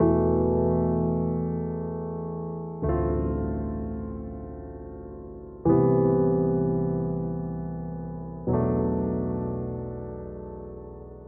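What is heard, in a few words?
Reflective Piano Chords, A minor 85 bpm; MIDI Clips; Tonal; Motifs; Ableton; Mood Reel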